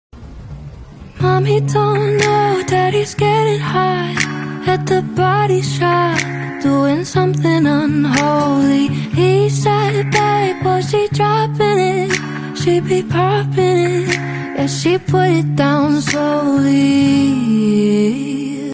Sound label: sing